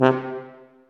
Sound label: TUBA, Tuba stacc; Roland L-CDX-03 Disk 2